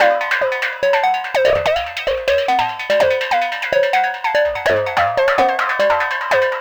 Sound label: Rez Synth Sequence; Electronic Point Blank